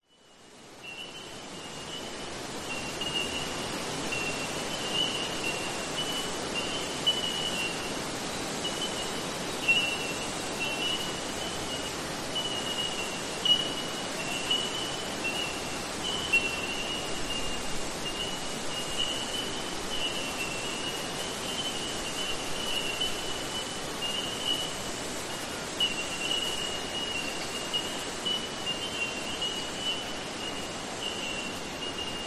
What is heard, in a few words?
nature forest ambience eastern australia summer bell minors insects; zapsplat